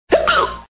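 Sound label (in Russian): икота детская смешная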